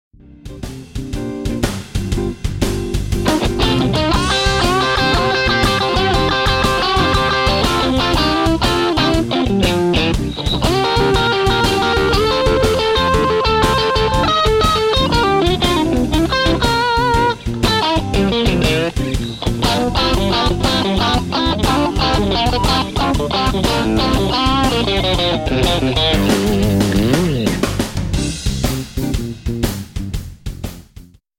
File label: Polyrhythm, jazz